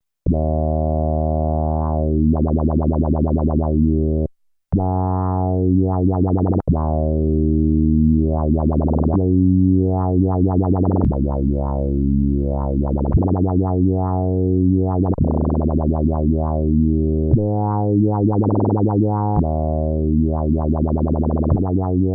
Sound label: synth woobled, Roland alpha juno